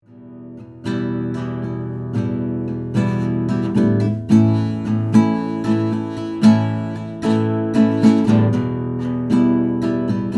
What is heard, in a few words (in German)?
Gitarre; Behringer TM1